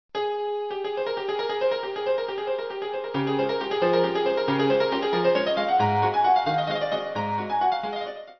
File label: melody western